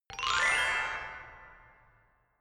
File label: notification glockenspiel horror slide up soft; instrumente